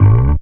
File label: BASS FX; E-Lab; X Static Goldmine 2; akai